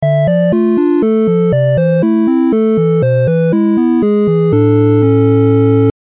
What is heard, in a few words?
Nokia Tune Minor